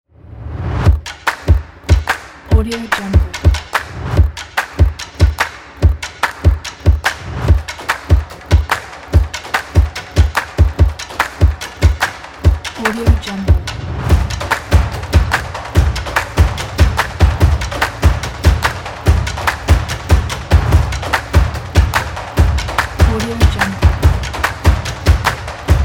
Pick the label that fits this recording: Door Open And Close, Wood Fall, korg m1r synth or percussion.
percussion